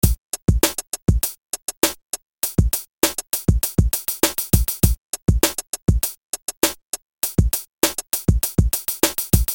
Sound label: Beat